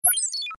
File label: science fiction digital console acceptance release code slow